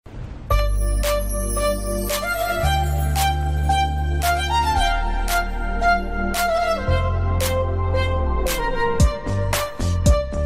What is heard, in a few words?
Kannada Instrumental Ringtone; Mr And Mrs Ramachari